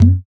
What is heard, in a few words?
CONGO, African Perc; Spectrasonics; Supreme Beats; African Contemporary; SB Afro DRMs